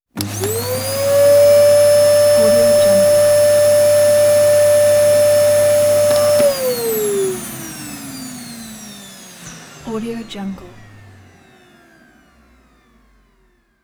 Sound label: vacuum cleaner turning on and off